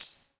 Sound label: TAMB